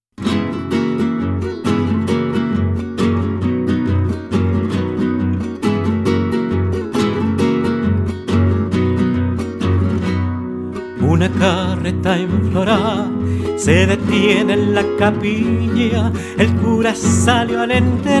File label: Latin Ensemble